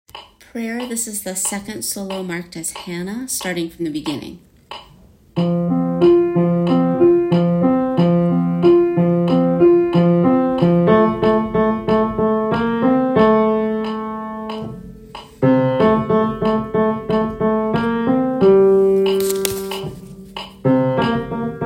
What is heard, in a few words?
Prayer Solo; Broadway Shabbat